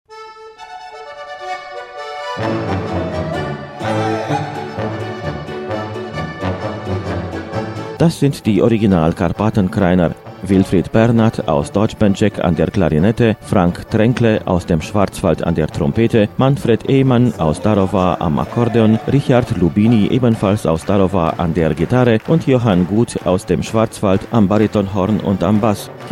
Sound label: Brass